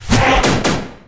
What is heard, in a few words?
Character Attack